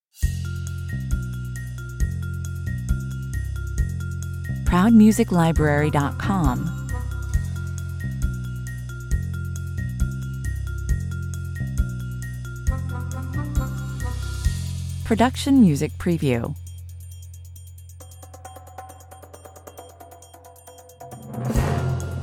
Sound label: (less lead no pizz strings)